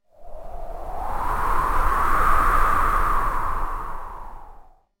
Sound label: amb wind; tourismustal